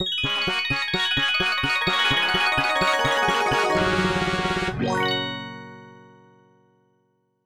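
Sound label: Fanfare, AdLib; Apogee; Blake Stone Planet Strike; MS-DOS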